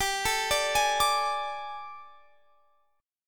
G Suspended 2nd Add Flat 9th G, chord; Gsus2addb9; sus2addb9:1,2,(5),b9; x,22,19,19,21,22

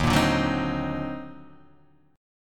D sharp Minor Major 13th, Guitar, Half Step Down

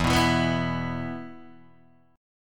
E 5th, Guitar, DADGAD

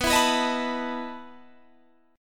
E Major 7th Suspended 4th B

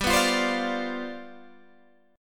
G 11th, Guitar, Open G

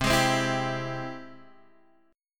G sharp Augmented Major 7th C

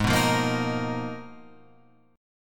Ab Minor 6th Add 9th, Ab, Guitar, Open F; strummed; JGuitar

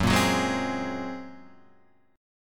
A sharp 7th Suspended 2nd Sharp 5th

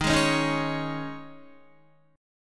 Eb 7th Suspended 4th Eb, Guitar; Eb7sus4; strummed

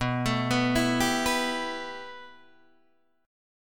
B 7th Suspended 4th Sharp 5th, Guitar, Open D

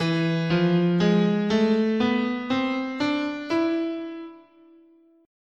E Phrygian Dominant, scale

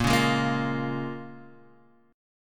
Bb Minor 7th, Guitar, Standard; Bbm7 strummed

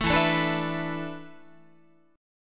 E 7th Suspended 4th, Guitar; E7sus4; strummed

